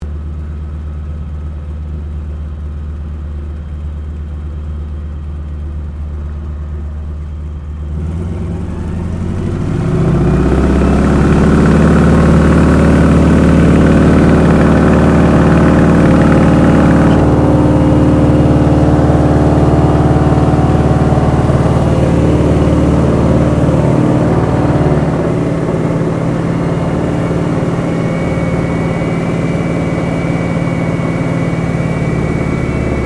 Aircraft AT 6D Harvard Mk III takeoff interior, American military training aircraft; Airplanes